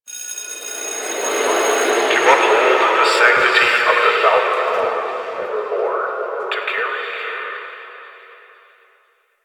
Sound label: Interior Cave, Ambience